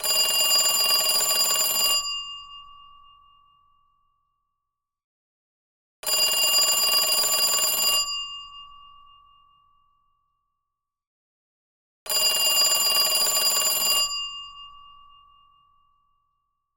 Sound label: telephony